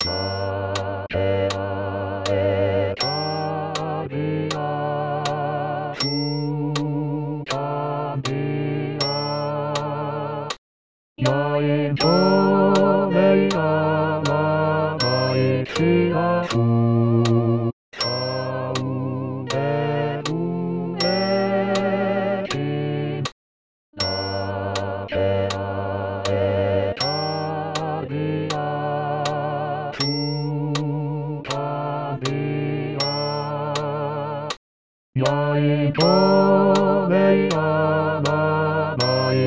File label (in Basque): Basse